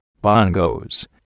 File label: bongos